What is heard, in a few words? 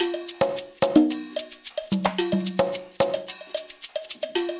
percussion loop; InVision Interactive; Percussion Slam